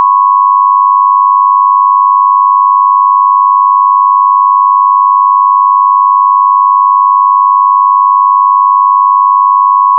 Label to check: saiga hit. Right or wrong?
Wrong